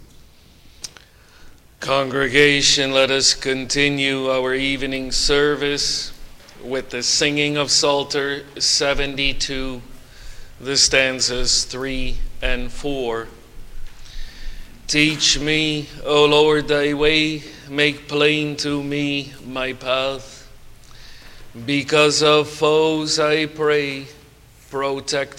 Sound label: Singing